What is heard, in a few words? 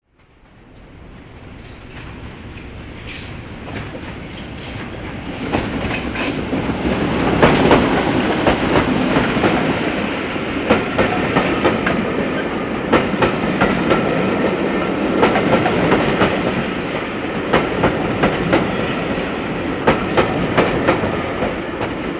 Electric Train Passes By Slowly